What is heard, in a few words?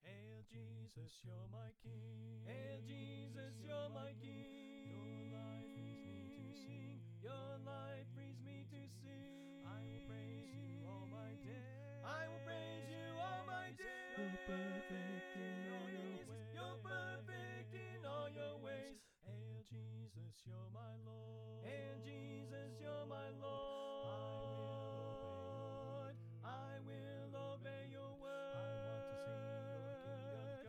Victory Chant Alto Left